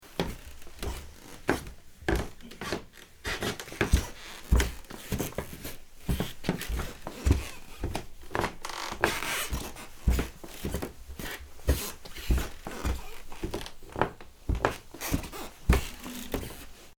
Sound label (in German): Schritte Holzboden